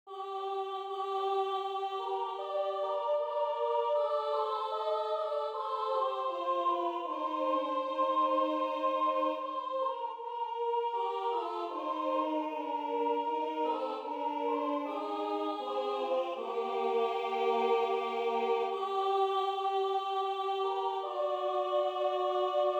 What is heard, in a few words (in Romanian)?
Sculati sculati boieri mari, Bucovina, colinde